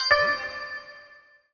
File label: powerup